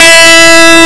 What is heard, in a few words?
Rock Guitar Heavy OD gtr E4